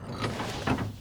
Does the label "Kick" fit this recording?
No